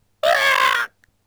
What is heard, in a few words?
axe thrower die; propack